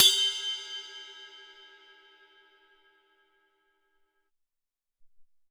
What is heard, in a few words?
Amb Ride, Alesis SR-18